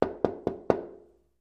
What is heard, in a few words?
Door Knocks Wood